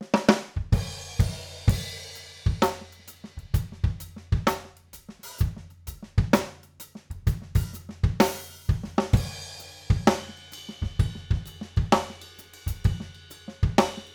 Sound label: OH Spaced Card, overheads, drum